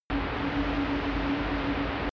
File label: drone spaceship howl